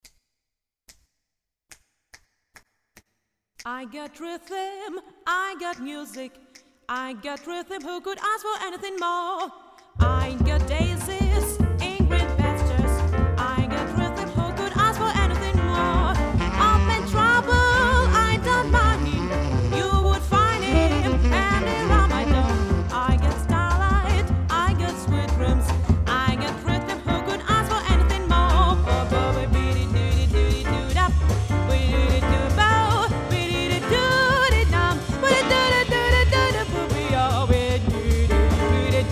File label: voc